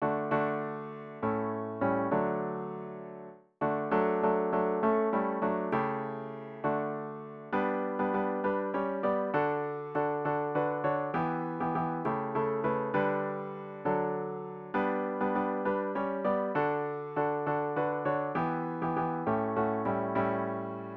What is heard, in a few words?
midi